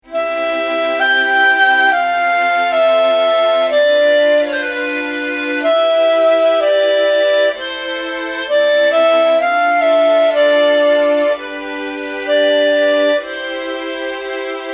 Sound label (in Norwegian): stryk og klarinett